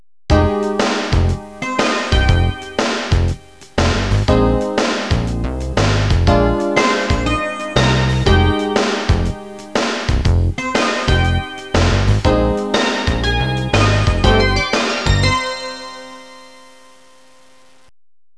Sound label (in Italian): suonerie businessy